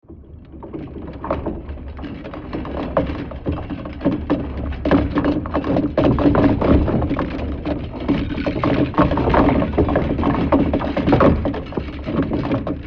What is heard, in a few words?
Draw Bridge Chain Metallic Clanking; Medieval; Creaking